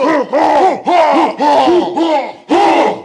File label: ha huh ha huh ha huh ha huh huh; ooh ahh